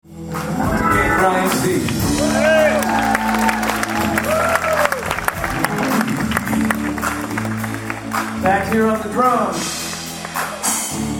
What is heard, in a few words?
Commodore Drum Solo